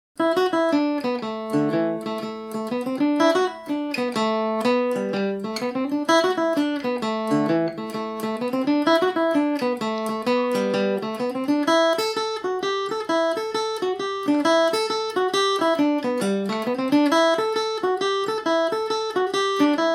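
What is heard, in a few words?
rocky road a dorian, mandolin; mandolin cafe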